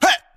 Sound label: heh, Voice; ooh ahh